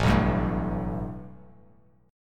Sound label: A 7th Suspended 2nd A down; A7sus2 strummed; A7sus2 chord